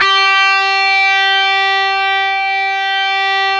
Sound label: GTR, Dirty Buzz; Club-50 - Foundations Roland